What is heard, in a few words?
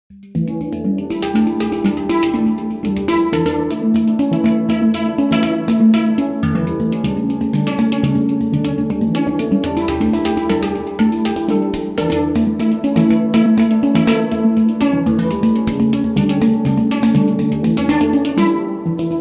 Quartet